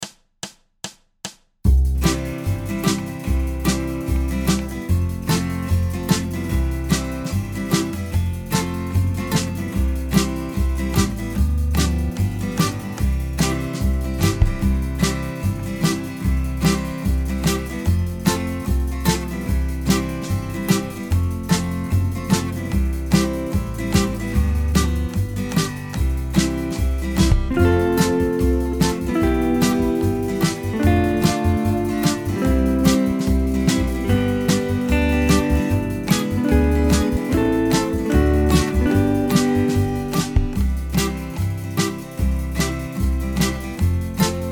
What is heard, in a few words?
Minus Guitar, Performance Backing Track